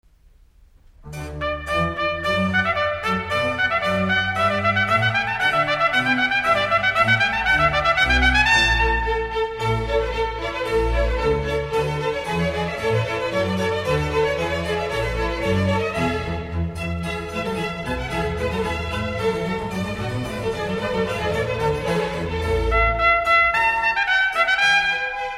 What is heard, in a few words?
Concerto for Trumpet and Orchestra in D; Telemann; Trumpet Concerti